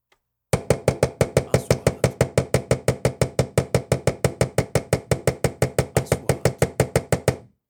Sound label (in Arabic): مطرقة; sound effect